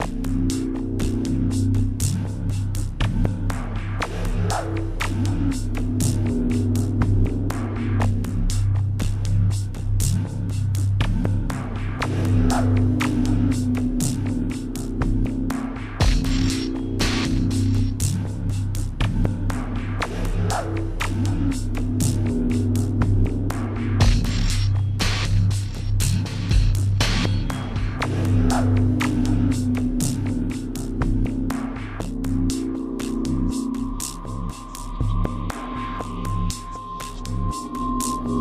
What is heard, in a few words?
Dialog Music; Mauler; Blood Rayn